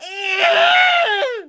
plyr ht; quake; halo; hurt

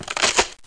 Chop; Bible Fun Pack; Education Publishing Concepts; NOAH

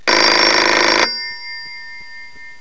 telephone

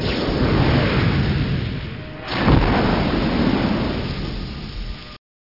alien; SCI-FI Sensation v2.2; aliens

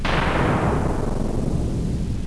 bombardment; Peripheral Empires

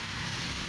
Zip Wire, sfx; urbanchaos

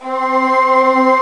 string; Descent

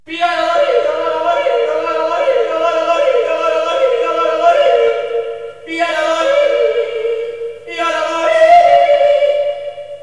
JODELAHI; L'Effet Pommier - Volume 04; HUMAN

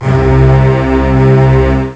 strings

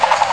DAGGER

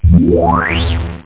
powerup; turbo invaders

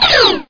eeeeeoooww; Bangin' Thing 1.0

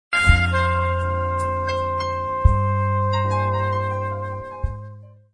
summer program error; OdeSummer

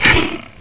shoot; Ferazel's Wand Demo; dillo

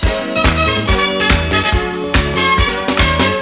Horn licks (brazil); meetmediaband; housejam

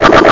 WHAWHAWAH

